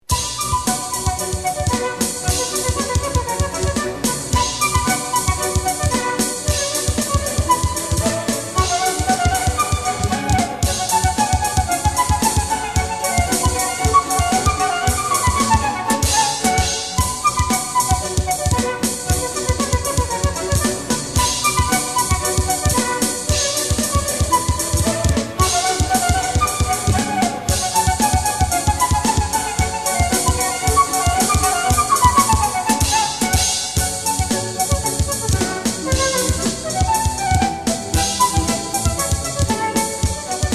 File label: bach flute